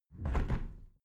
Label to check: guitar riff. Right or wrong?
Wrong